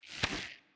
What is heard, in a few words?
logo out